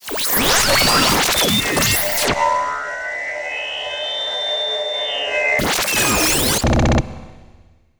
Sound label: Power Up